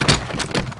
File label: launcher; iris